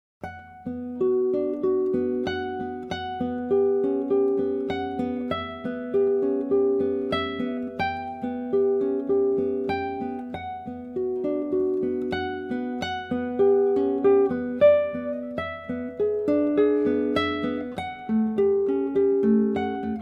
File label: Prelude